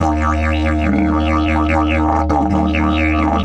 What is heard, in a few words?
EF 140, DIDGE RIFFS; Big Fish Audio - Didgeridoo and Other Primitive Instruments